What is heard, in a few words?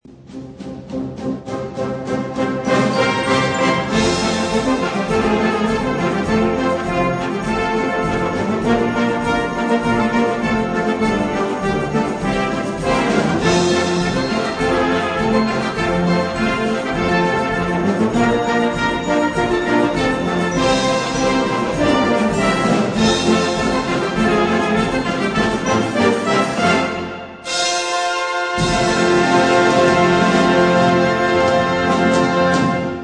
Jingle Bells, band